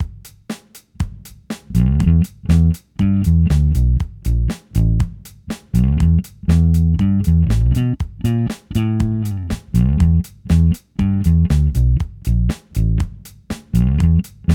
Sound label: thermonic od, bass; Thermonic Effects TE.01 Tube Overdrive; Quickie 1-take demo.; Lakland Duck Dunn, Lindy P, Labella flats, finger style, into Zoom B3 Ampeg sim, along with an Abbey Road drum lo